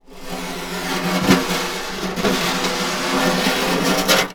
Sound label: Snare Drum; Percussion Samples